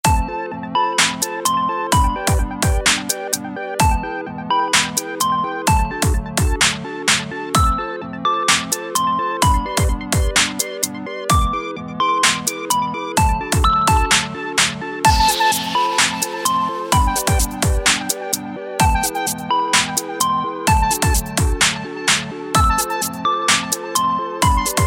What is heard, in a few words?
Retro Gamer